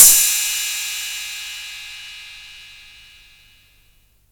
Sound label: Cymbal Sound Sample D Key, Royality free cymbal drum sample tuned to the D note